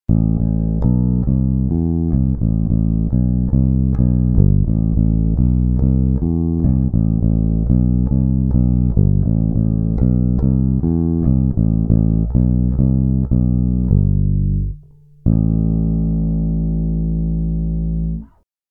Neck, bassblaster; szeregowo

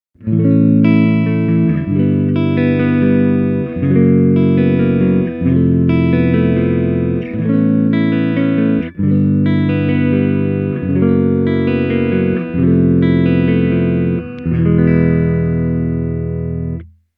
Neck Clean

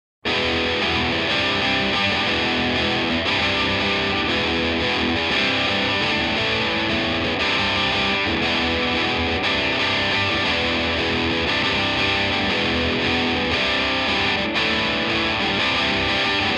Eclipse Higain, 7string

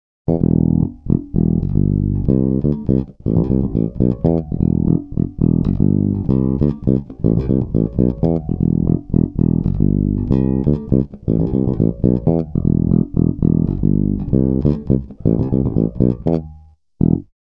JB bridge

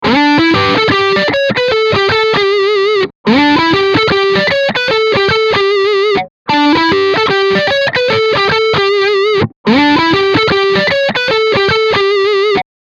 NECK drive, eclipse active, line6

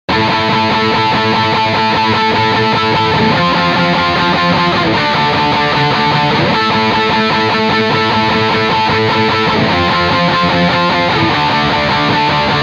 BRIDGE drive, eclipse active, line6

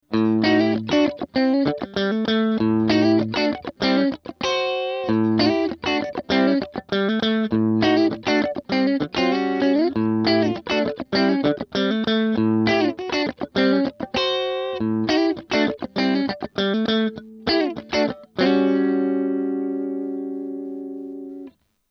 tele bridge neck

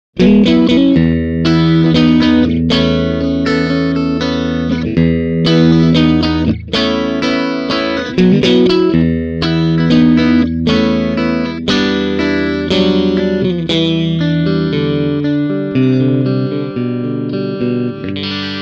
Czysta, classic strat humbucker

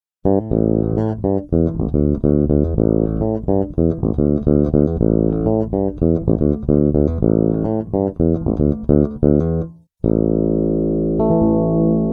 Bridge parallel, bassblaster; rownolegle

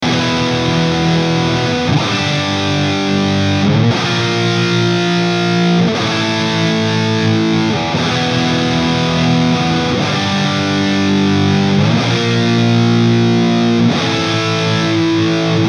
NECK, eclipse active, 5150

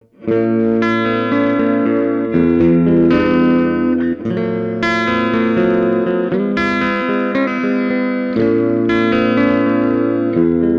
eclipse bridge clean; tech21

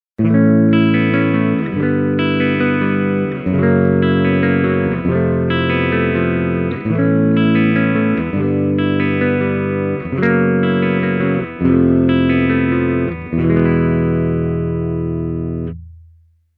Bridge Clean, legend

